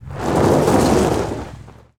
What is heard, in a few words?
Auto Tires Slide Gravel; Automobiles